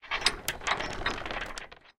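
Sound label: bridge creak; sfx tanks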